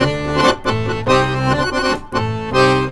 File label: ACC, Balkanish Traditions